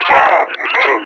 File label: Enemy Pain; Warframe; Lasrian; Battle Barks